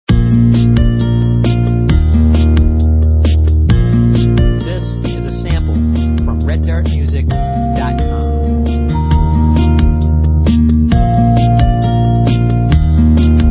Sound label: Nylon Beat Box; Beatbox groove with Guitar and Bells